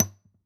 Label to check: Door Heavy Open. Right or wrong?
Wrong